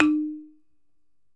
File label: BALAFON D; E-MU Producer Series Vol. 7 – Old World Instruments